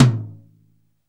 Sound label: DRY TOM H3; Back In Time Records; Big Bang